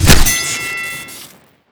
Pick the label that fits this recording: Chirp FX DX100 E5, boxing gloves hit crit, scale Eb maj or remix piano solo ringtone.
boxing gloves hit crit